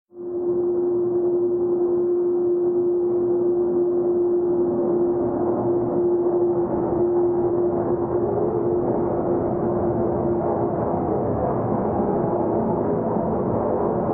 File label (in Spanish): fondo tren